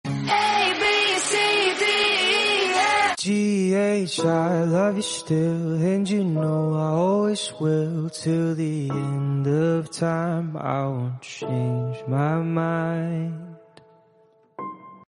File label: cute a b c d